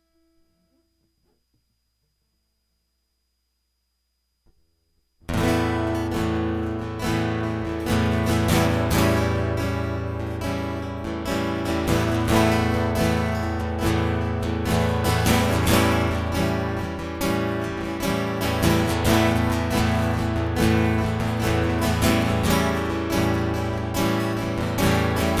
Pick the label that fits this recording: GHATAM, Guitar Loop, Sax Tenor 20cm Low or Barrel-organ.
Guitar Loop